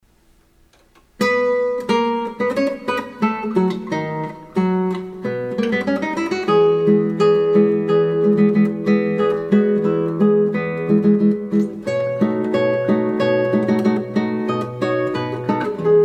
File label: Progression March, guitar